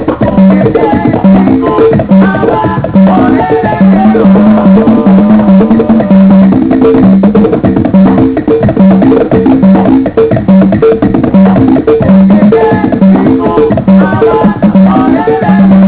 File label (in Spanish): rumba